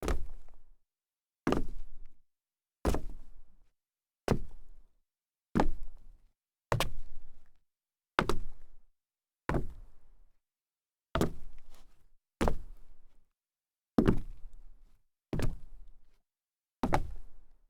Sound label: sneaker small jump solid wood, foley